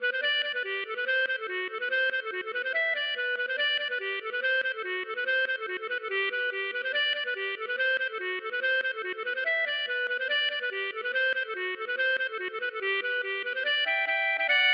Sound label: polka